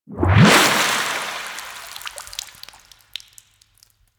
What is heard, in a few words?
Sharkwing Water Exit, Vehicles; Warframe